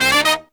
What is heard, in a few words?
120BPM, Horns